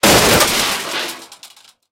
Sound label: metal small crash